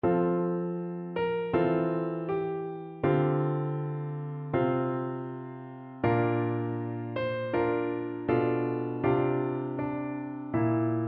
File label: PNO